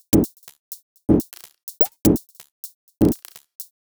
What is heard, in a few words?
Top 125bpm, House Drumloops